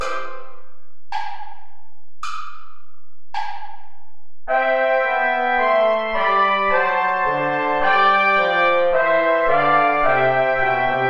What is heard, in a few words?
play along without trombone